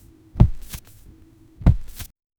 use gentle sound effects, farm

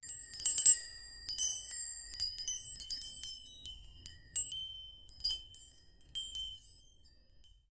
metal chimes clashing, wind chimes

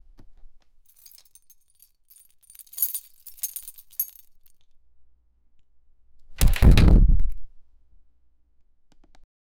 apartment door unlocking

